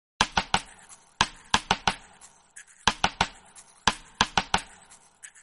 single clap sound

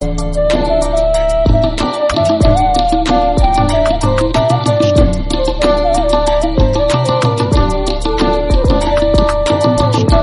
flute

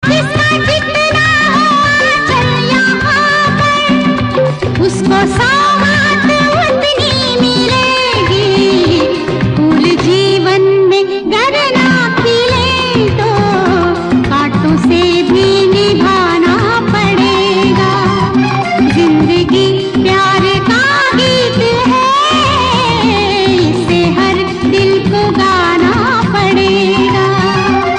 hindi; souten

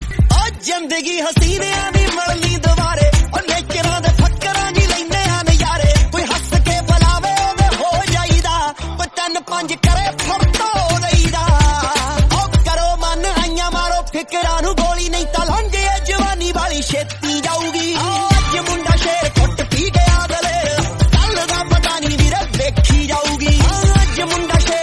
punjabi song

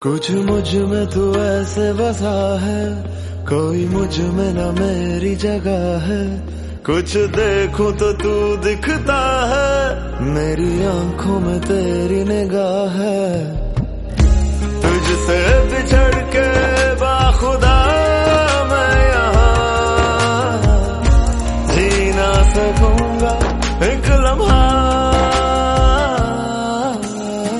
hindi song

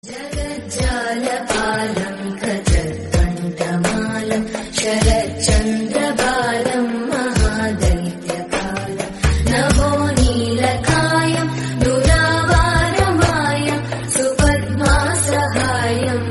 vishnu stotram ringtone devotional

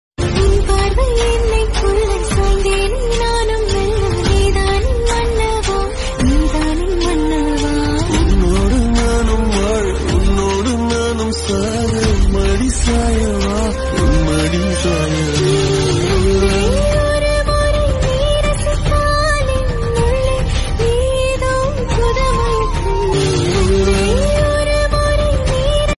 love song tamil

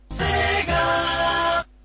startup noise; sega

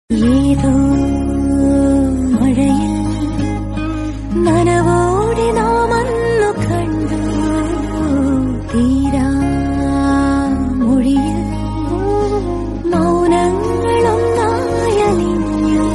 love song malayalam

